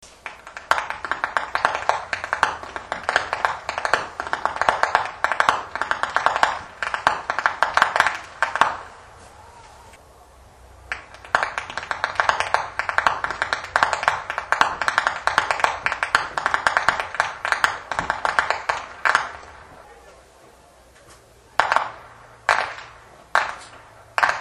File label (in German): schnalzen, flachgau; liefering